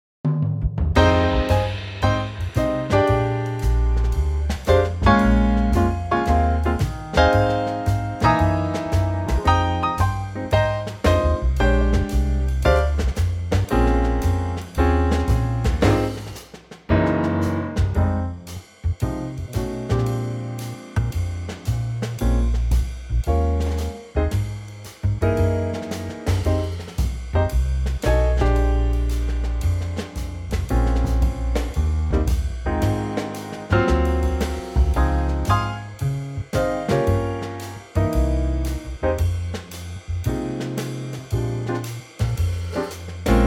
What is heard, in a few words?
Ab trio